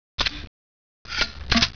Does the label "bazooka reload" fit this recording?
Yes